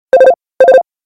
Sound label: digi loud